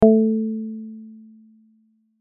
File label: chord